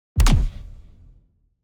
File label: heart damage kick